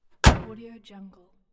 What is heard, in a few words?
car hood close; soundjay